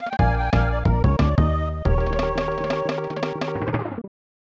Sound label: Lose Life; DK Country 3; Pipes